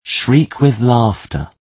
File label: shriek with laughter